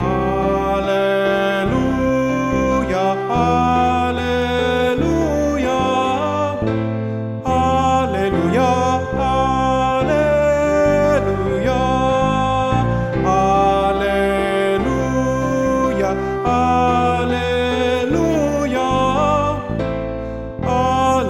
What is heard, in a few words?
Descant